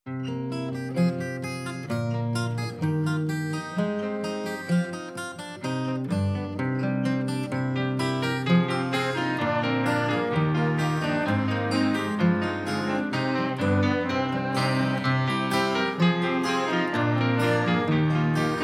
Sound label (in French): version instrumentale